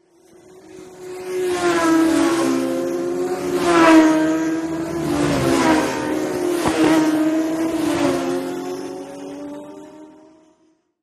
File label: Motorcycle Road Racing